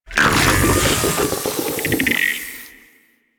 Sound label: Infested Drop Pod Emerge; Warframe